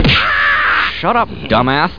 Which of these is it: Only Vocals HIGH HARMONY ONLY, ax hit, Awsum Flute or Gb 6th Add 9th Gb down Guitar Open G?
ax hit